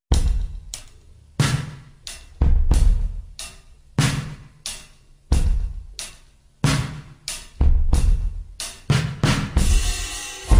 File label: ultra slowed extended version reverb